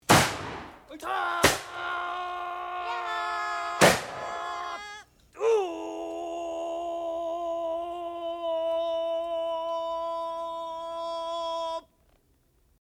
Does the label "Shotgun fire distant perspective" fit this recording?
No